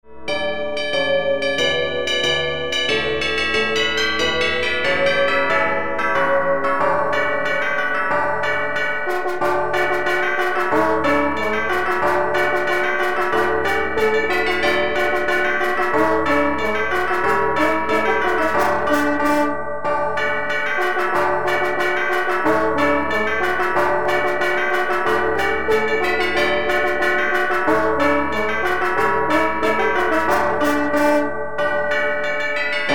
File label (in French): chant canal droit